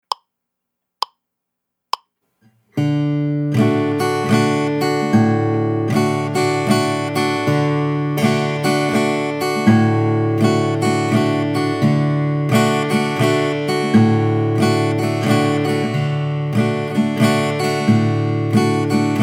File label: Carter Scratch Waltz Strum